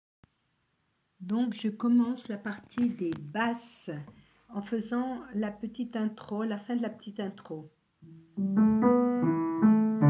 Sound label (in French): Basse partie 2